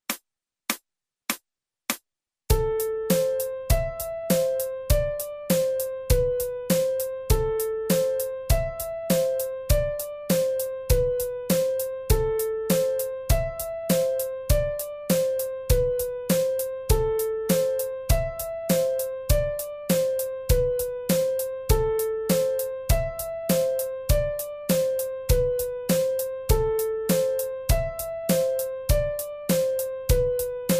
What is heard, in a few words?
Piano